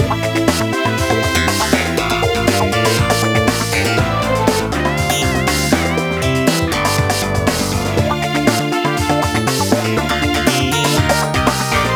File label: LOOP; Deathwish Enforcers